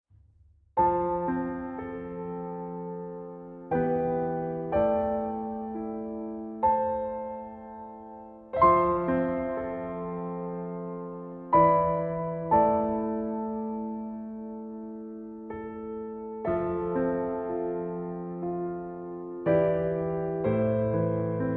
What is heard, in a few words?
Piano; Attack on Titan